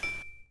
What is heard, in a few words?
Drop Axe; Civ4 warlords